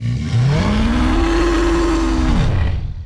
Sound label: roar, charger; Giants